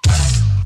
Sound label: two funks